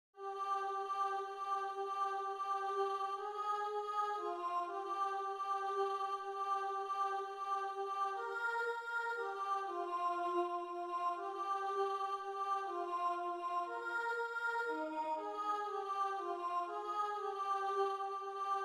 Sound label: alto